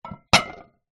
household bowl ceramic movement down on dish

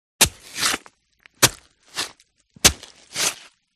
Knife Flesh Out; audio hero

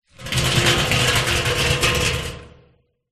metal oil drum empty drag concrete ground; foley

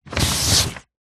paper sheet a4 pull slide out from under another; foley

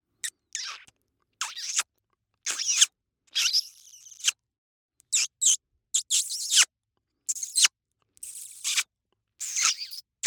KISS several piercing funny pucker smacks

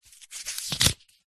household book minature page turn; zapsplat

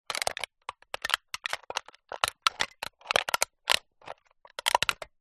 soda can empty cut open movements pressure; foley

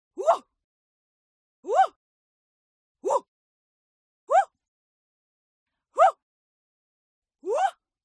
HUMAN VOCAL Female Attack "Wuh"; smartsound

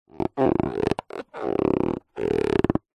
foley balloon small inflated rub handle creak; zapsplat

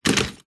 office chair set down tiled floor

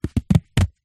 househopld rubber glove stretch

